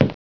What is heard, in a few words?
banghead